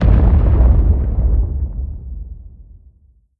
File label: deep step short, strider, steps; creatures; Half Life Model Pack